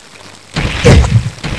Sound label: pain; quake2; link